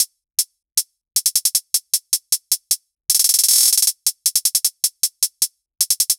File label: Hybrid Trap Kit 155bpm Hihats; Drums; EDM Drums; Drum Kits; Stems; Ghosthack; Ultimate EDM Drums; Drum Mega Bundle; Hybrid Trap Kit 18